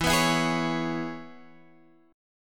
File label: C sharp Minor 7th E, chord